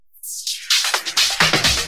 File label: Minimal Fills; Minimal Loops